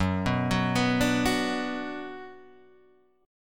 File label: F sharp Suspended 4th Sharp 5th, Guitar, Half Step Down